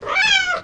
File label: die; deviruchi